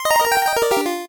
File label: 8bit se